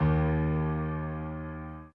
Piano Chord

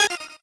normal hit normal

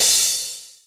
soft hit finish; osu

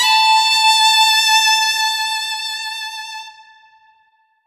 violin; osu

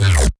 bass c

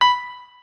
piano

